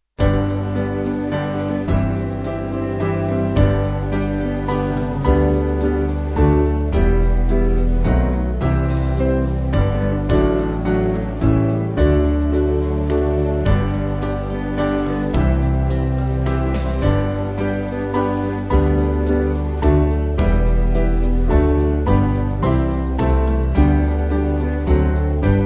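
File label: Band, Music Backing Files for Churches; What A Friend; Blaenwern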